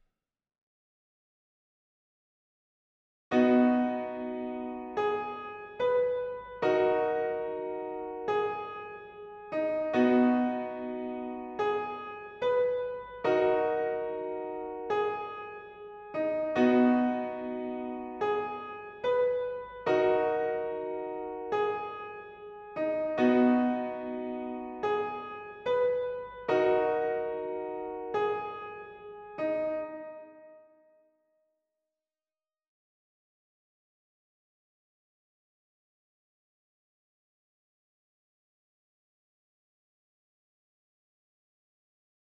piano

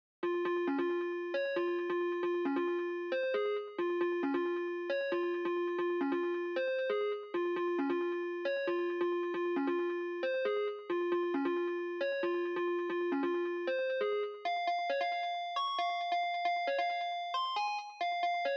funk melody

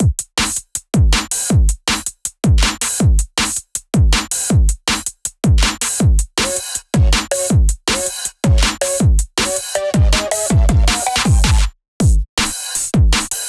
drums

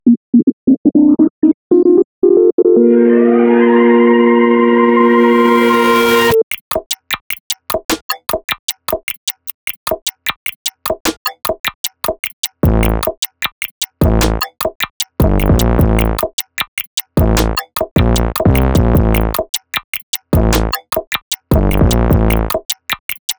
INSTRUMENTAL

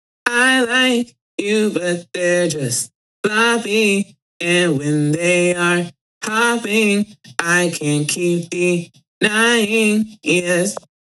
vocals prehook

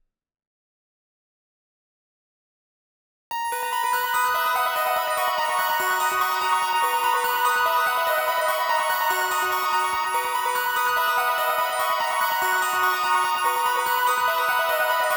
bell

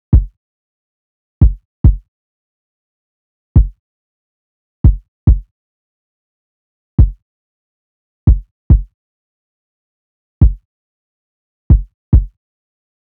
stupid art kick

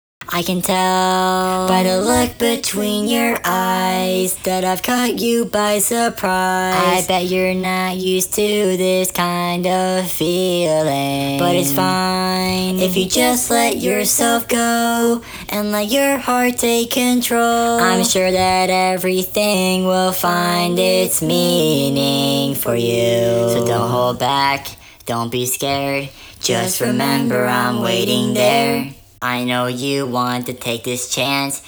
ringtone vocals (full) 173.4 bpm

